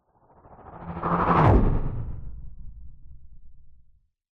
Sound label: science fiction hovering vehicle pass by fast